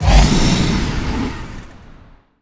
spell magic wind

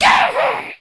middle die

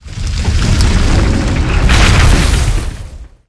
monster action plants

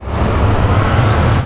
spell power magic arrow cast

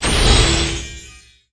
spell fighter confusion

